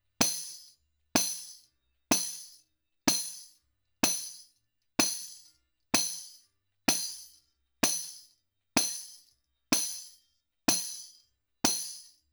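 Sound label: Tambourine; Daking; Fet II Fairchild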